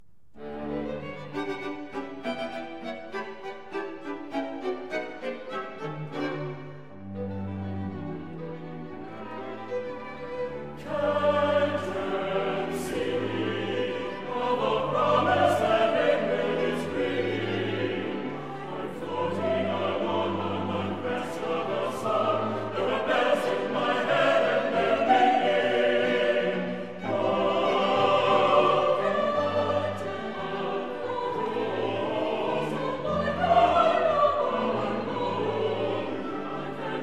Singing

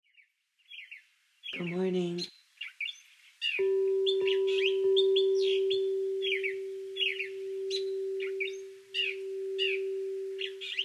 Morning Bird Song

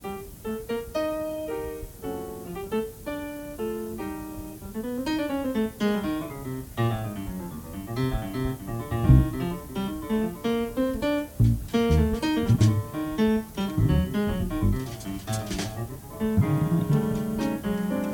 trio

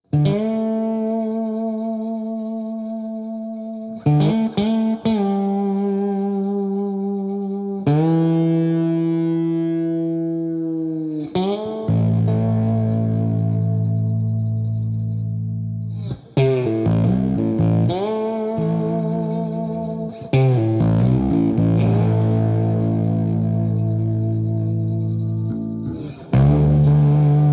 Blues

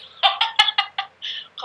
Haha

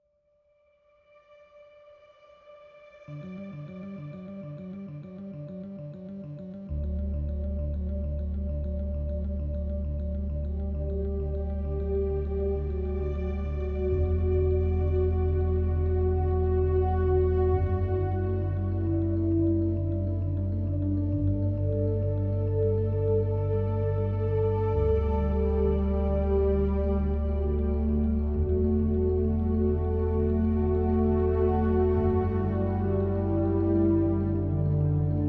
Synthetic Clouds Electronic

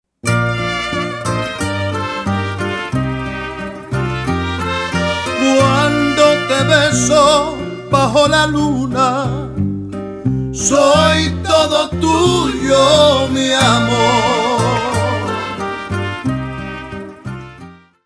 Ranchera; Chevy Cobalt

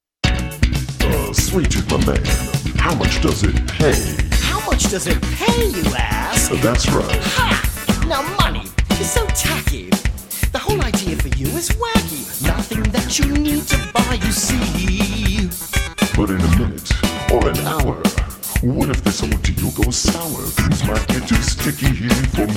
Funky Sticky

